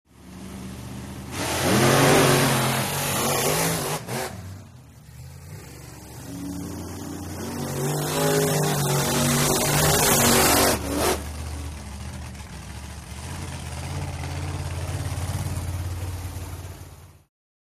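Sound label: Monster Trucks Revving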